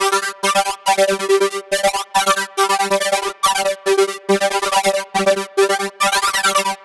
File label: Arp 140bpm G, Trance; Arps; Zero-G Trance Inducer; 90 s Sample CDs